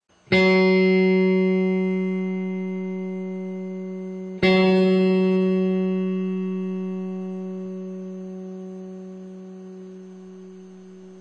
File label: acoustic tune up 3rd, guitar